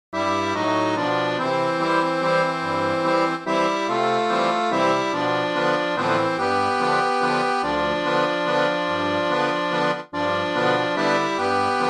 waltz

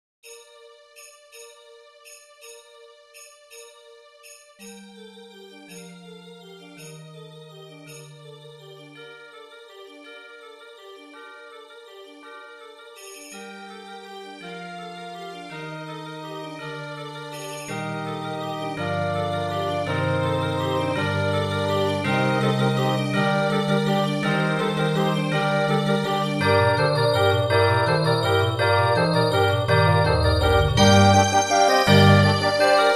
midi

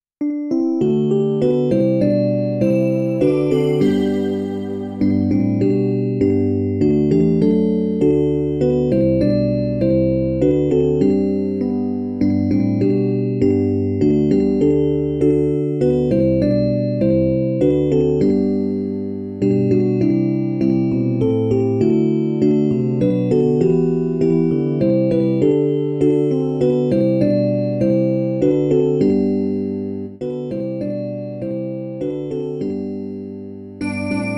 infant holy infant lowly midi

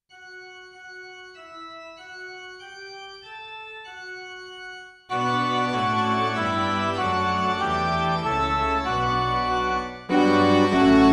kyrie midi

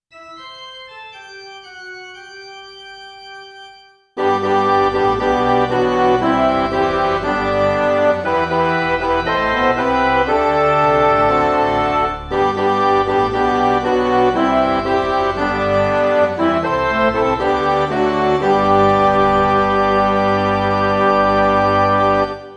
lenten gospel acclamation midi

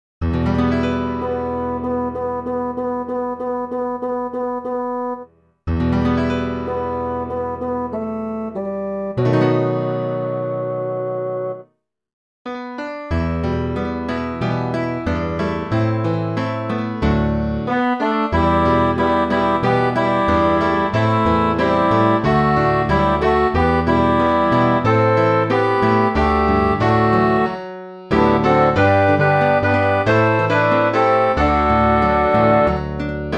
when we eat spanish midi

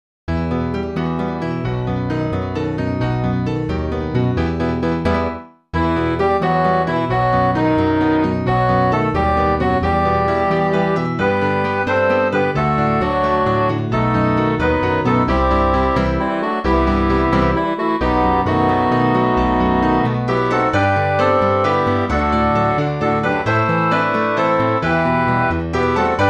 holy english midi

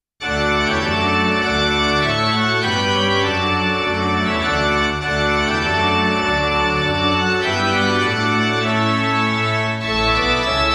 while shepherds midi

